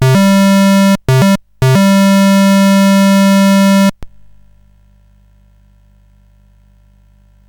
Dragonworld sid CSG8580R5

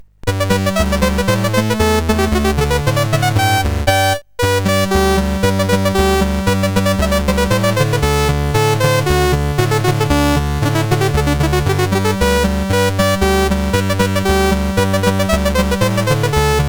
Polka, sid CSG8580R5; Master Composer

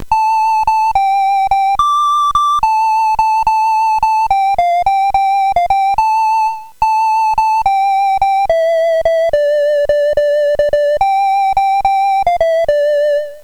Melodia, CSG8580R5

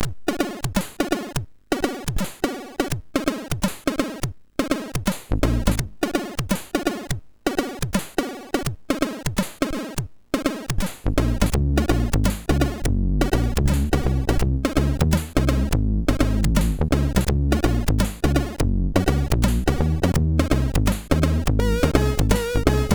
Happy Birthday, sid MOS6581R4; Happy Birthday Ecco

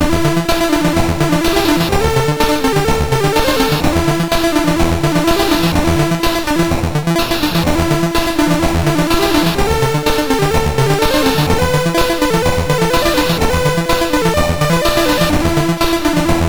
Synth Sample, MOS6581R3

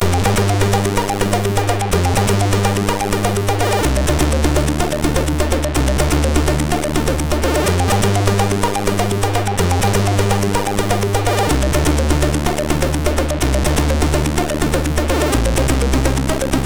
Tune, MOS6581R3

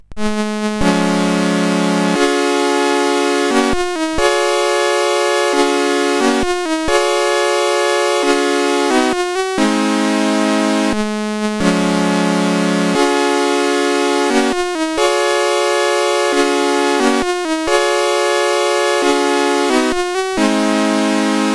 Music, CSG8580R5

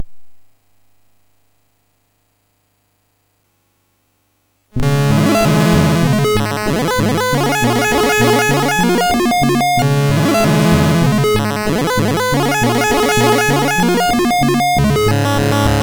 Wild Arpeggio, MOS6581R4

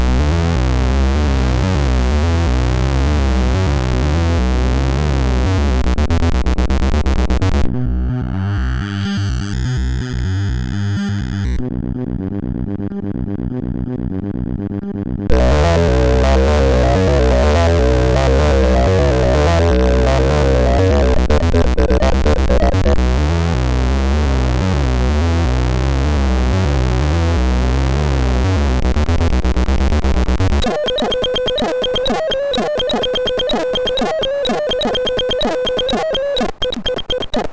Techno, MOS6581R2

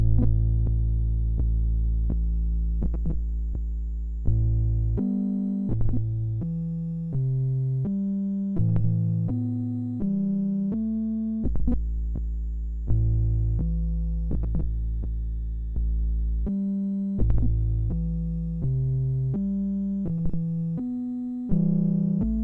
sid MOS 6581

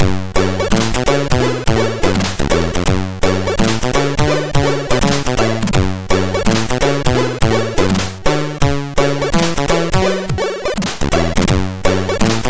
Jazzy Mood, MOS6581R4

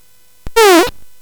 Space Gun

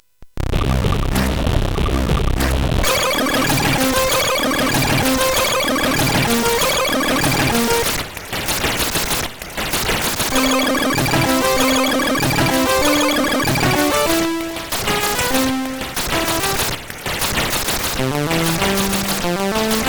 Train in Love, CSG8580R5; hvsc

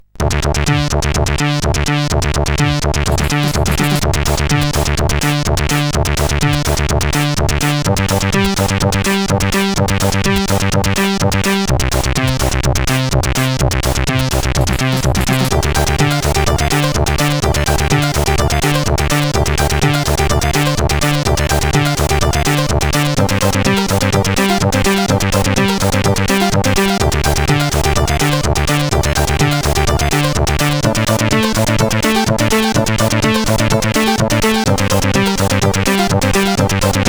Techno, sid CSG8580R5

